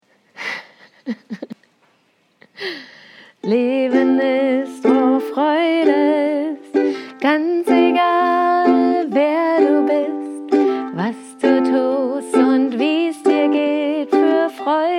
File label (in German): Song mit Kichern